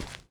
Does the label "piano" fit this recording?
No